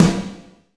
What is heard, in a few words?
Snare, Alesis DM5